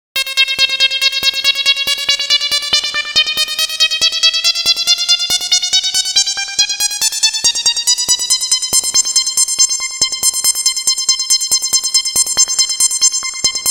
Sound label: Rise, trance; abx3 trance presets vol3